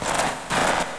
Hungry Wolf Walk

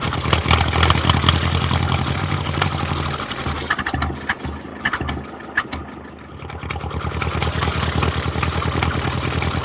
Steam Idle; Seer; GamigoNA

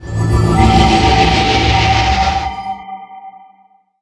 Thunder Bolt

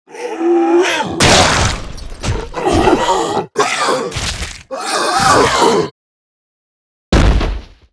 Die; Seer; GamigoNA

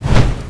watchman fear

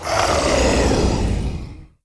die; JokerTrumpy

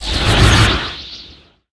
Piercing Guard

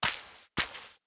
Walk

